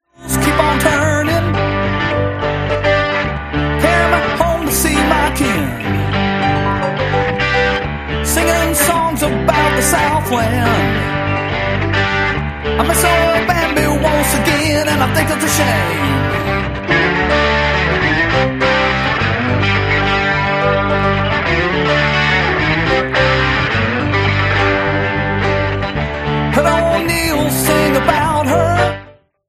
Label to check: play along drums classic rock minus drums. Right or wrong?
Right